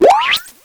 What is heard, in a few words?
Stomp; Wii